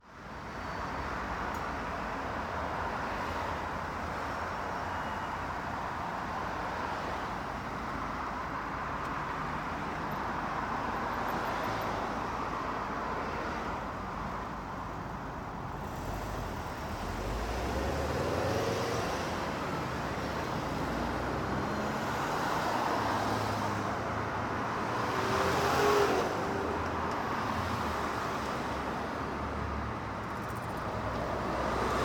City Autos By Close From Center Median, Heavy Traffic; 5.1 (LCRLsRsLf); Ambisonic; 2496SoundEffects